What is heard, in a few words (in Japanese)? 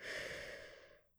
ブレス ロング